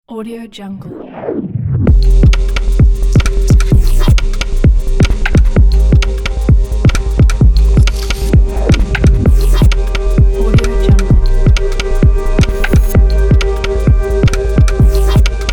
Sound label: glitch atmosphere logo